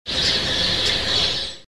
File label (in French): Voliere